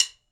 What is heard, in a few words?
Stick